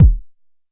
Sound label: Jomox MBase, drum machine; Jomox MBase 01